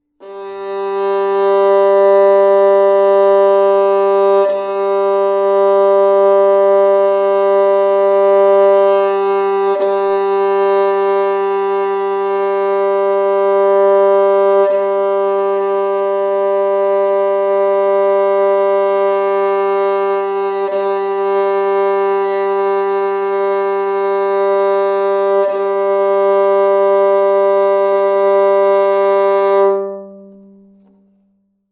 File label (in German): geige g saite stimmen